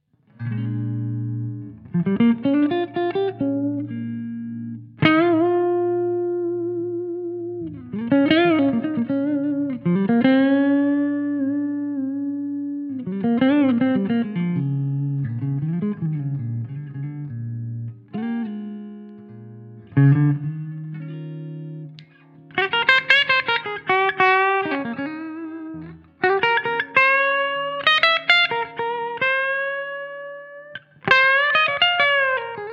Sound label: clean solo